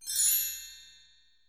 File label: Sound hover